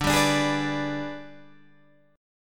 Ab Minor Major 7th Flat 5th

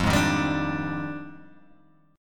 F Minor 13th, Guitar Open G

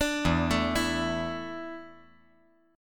E 7th Suspended 4th E down 5G 4C 5E 5A

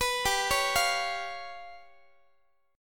G 7th Flat 5th, G, Guitar; arpeggiated; x,x,14,17,17,16; 4A,4E,5A,5D,5Gsharp,6Csharp

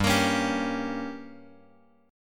G Diminished; Gdim Chord {3 X X 3 2 3} strummed

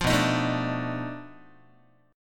B 7th Sharp 9th Flat 5th, down, Guitar; B7#9b5 strummed; JGuitar

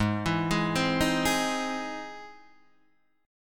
E Augmented Major 7th Ab

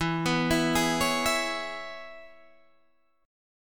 E 6th, down, Guitar, Open D

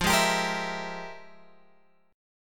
F Minor Major 11th; {13 11 X 12 11 12} strummed

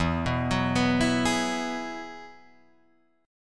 E Major Add 9th, Guitar Drop D

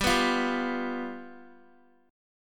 G 7th, Guitar; G7 strummed

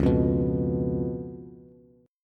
Db 7th Db down Bass; Db7 strummed; JGuitar

